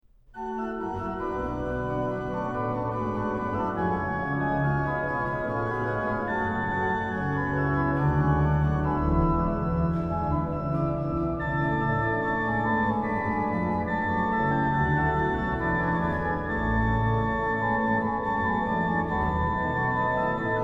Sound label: organ; BWV0603; Puer natus in Bethlehem; BACH; BACH ORGAN WORKS; CHORALE PRELUDES